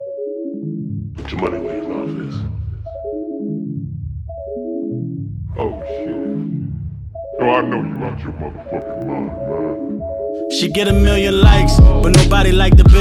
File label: Belly Ambiance